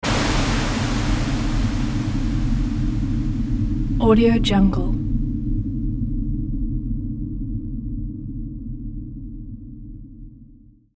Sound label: pressure release; sound-ideas